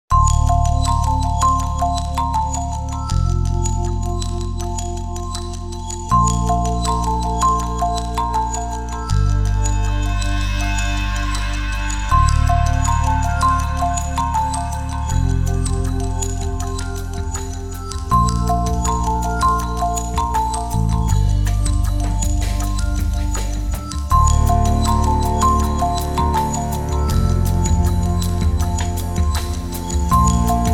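calm alarm clock in lounge style, lounge downtempo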